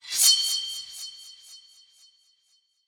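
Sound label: Knife; Yu-Gi-Oh! Master Duel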